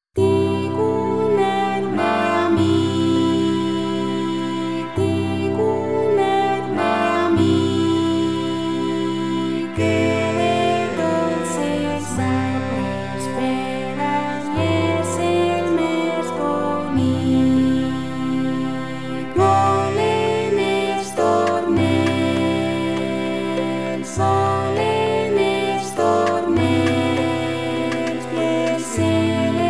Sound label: SATB